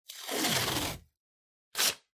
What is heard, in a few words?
Kraft Tape Pull Rip